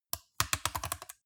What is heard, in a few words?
metal device rattle click soft